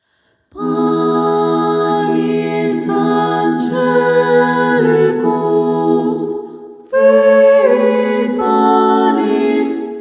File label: Panis Angelicus SSAA; liturgias especiales; bodas